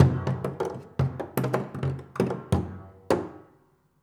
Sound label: Body Thump, Piano Destruction